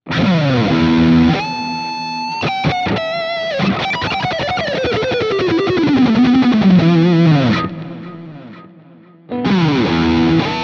Schecter Exotic Ziricote Lead Riff A all positions